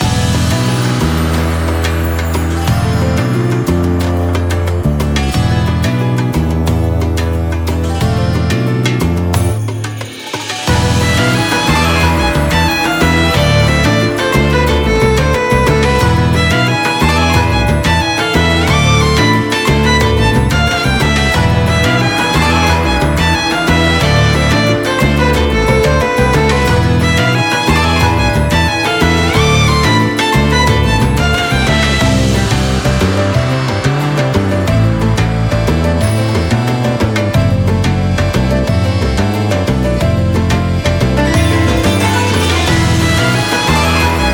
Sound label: Desert loop